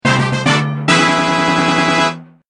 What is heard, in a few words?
Full Heart Container Fanfare; Zelda